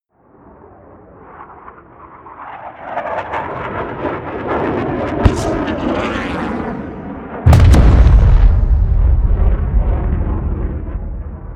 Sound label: air strike; krieg waffen; flugzeuge